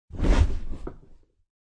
Sit Cush Chair, Cushion and chair sounds as person sits down.; Sitting (Sound Effects:Human)